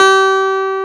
CLAV C4; Keys & Pads; AKAI S6000 CD-ROM - Ultimate

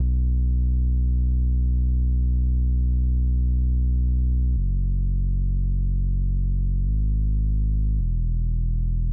Bmaj 105BPM Bass; 99Sounds; Monosounds; Music Loops; PANDORA BOX!; Warm Night

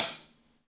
V-Pop Closed Hi Hat, Roland BK-5

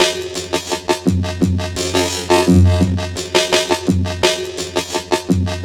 Drum Bass, Drumloops, 170bpm; Zero-G; Total Drum Bass

